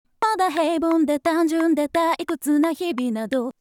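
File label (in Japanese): Synthesizer V, 調整後, 歌声; Synthesizer V Studio 2 Pro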